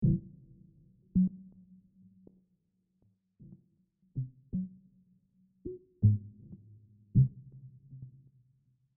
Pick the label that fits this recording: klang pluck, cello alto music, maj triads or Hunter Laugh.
klang pluck